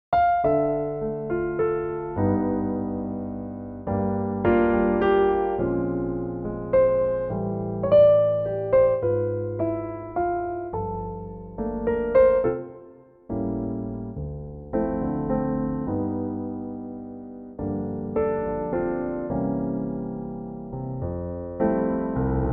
F Piano

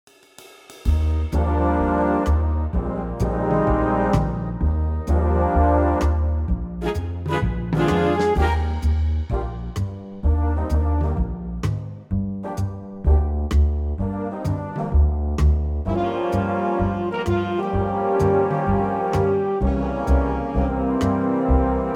Big Band Eb

